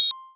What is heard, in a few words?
coin, square waveform; Square Waveform sfx